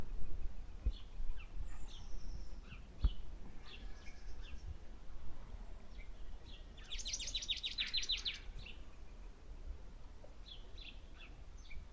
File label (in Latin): Poecile palustris; SETE